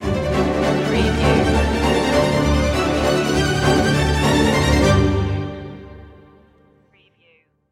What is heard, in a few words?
the storm is coming stinger